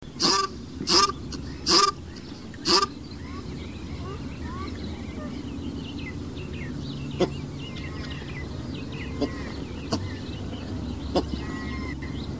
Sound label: cape shelduck